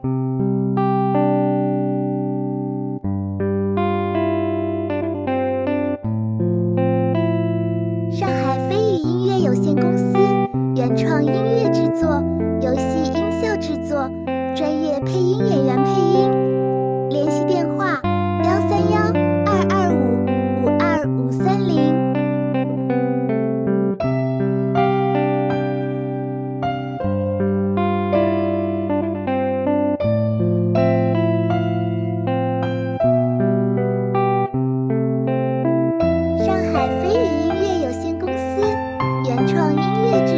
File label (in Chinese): 电子舒缓柔和; 休息